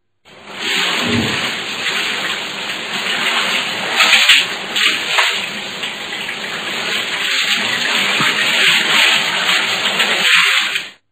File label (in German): Dusche Gartenschlauch; Haushalt